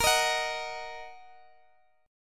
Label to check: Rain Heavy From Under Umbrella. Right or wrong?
Wrong